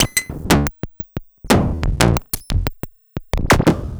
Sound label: FM Bleep Loop; Polyend; Stazma & Concrete Collage